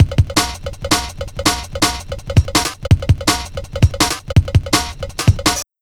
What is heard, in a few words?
165 Bell, Loops, breaks; M8